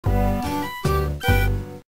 Transition; ratatouille gba 2007

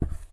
footsteps carpet Walk

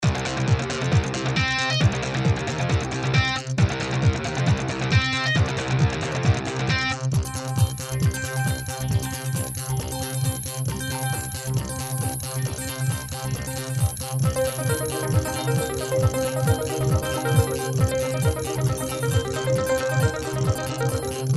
Fight; Adventure of Heroes; Android

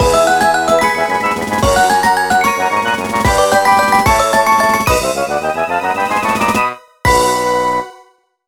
Jingle Comp

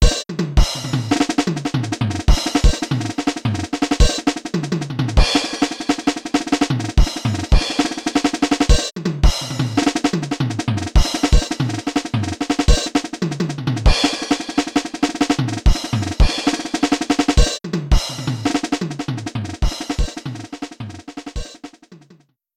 Movie BGM, Noisy; Mario Artist Talent Studio

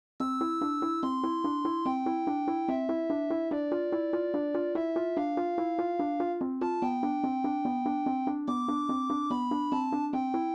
Roland SC-88; SimCity 2000

